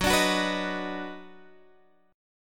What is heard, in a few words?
Eb Minor Major 7th Sharp 5th Gb, chord